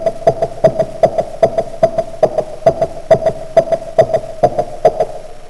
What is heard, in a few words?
bat Sounds